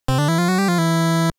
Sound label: jingle